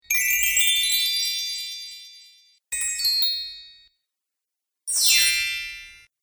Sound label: Snowflake Bells; Bell Sound Effects; musical sound effects